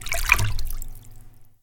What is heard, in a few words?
Fluid Impact Hard